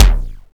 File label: SDS5 KICK F2; Greytsounds VOL-1; SDX KICKS